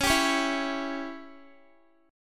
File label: C sharp Diminished C sharp, Guitar; strummed; C#dim